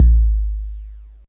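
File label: bass drum 808 SP1200 G#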